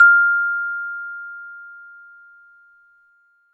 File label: Chimebar F6